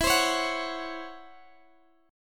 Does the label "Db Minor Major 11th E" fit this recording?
Yes